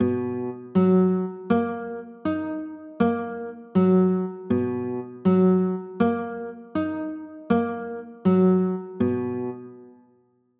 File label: b maj7, chords